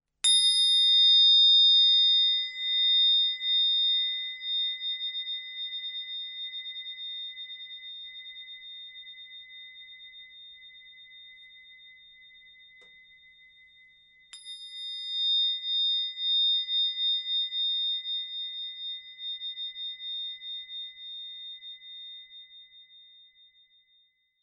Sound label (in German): klangpyramide; crystal pyramid sounds